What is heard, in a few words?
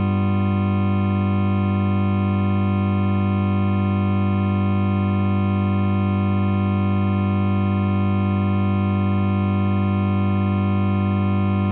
g chord, Omnichord; g7; OmnichordGodot